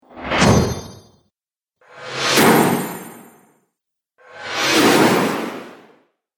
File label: Cartoon Magic Explosive Sparkles